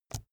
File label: keyboard laptop space release soft